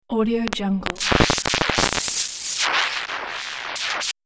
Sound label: static spin glitch